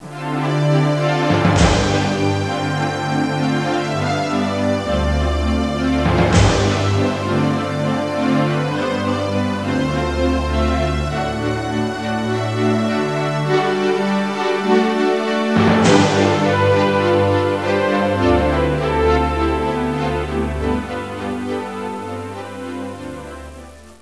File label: 8bit orchestral